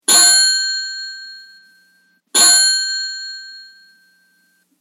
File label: Antique Bicycle Bell; Bicycle Sound Effects; Bicycles (Sound Effects:Vehicles)